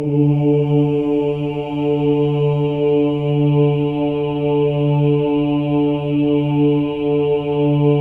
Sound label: OHS, Symphony of Voices; Spectrasonics